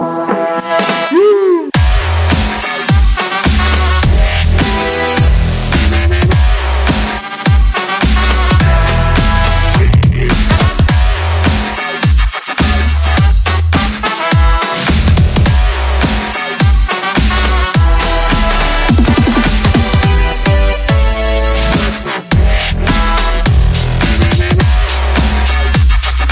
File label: dubstep